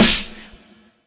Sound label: big room acoustic snare d key; snare drum samples